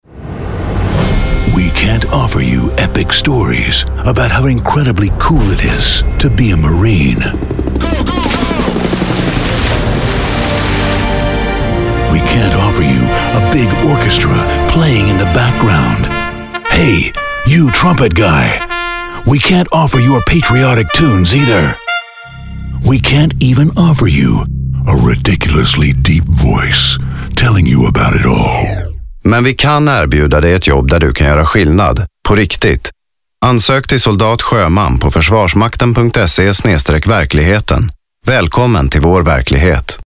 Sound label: trumpet; forsvarsmakten